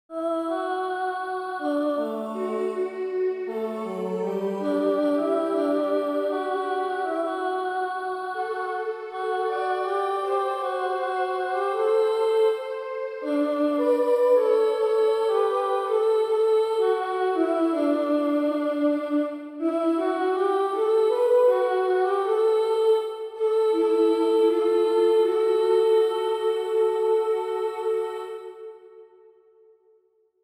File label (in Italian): Voce 2 Voce 1